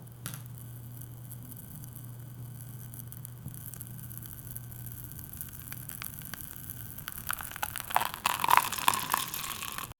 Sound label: sound of coffee powder be, powder